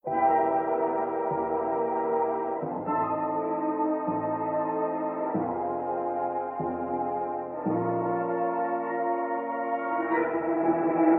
Horror Movie Calm

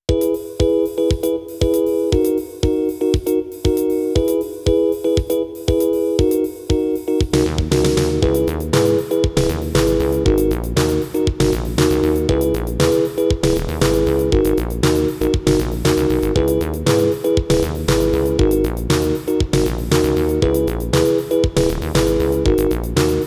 MIDI Instrument Version

